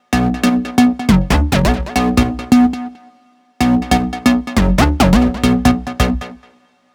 138 BPM Square Bass, Bminor; Bassline

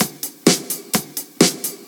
Housebeats, 128BPM; Vengeance Samples; VEH2 Loops

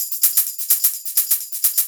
Loop, 128 BPM; Vengeance Samples; Vocal-Hook Kits; Song Kit

